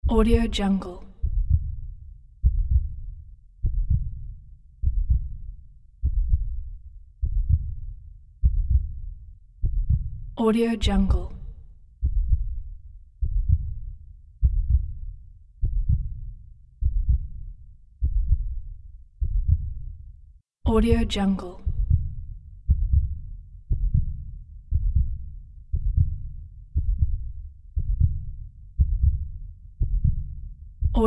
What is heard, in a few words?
tense heartbeat